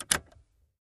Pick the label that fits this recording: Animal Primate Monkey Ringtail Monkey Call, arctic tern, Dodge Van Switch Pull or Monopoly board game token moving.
Dodge Van Switch Pull